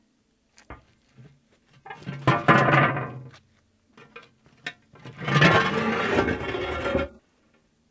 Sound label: Manhole remove